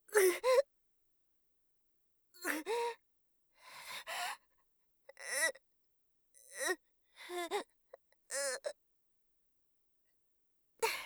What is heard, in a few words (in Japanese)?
鈍痛; 効果音なし